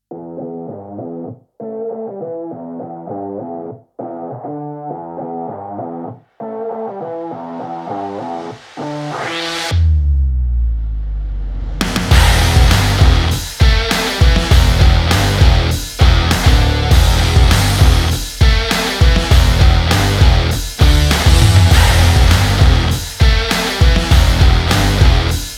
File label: Rock Beat